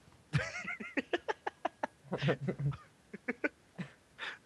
risada

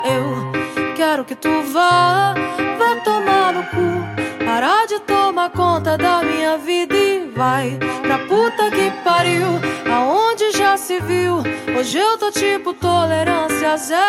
funk

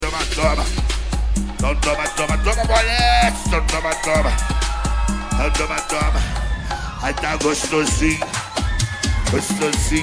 apresentacao impecavel na roda de funk

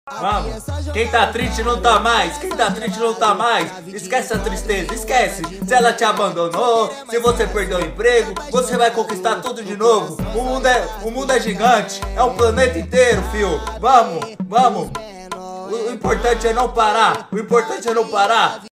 barulho

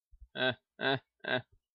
risada marota

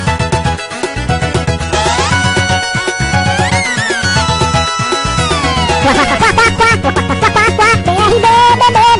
musica qua qua qua br br

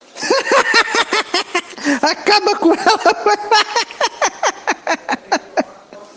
risada macabra

